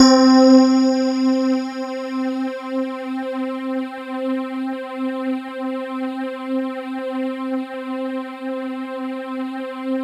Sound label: C4, Synth Line; 90 s Sample CDs; NiceBeats; SynthLine VOL-31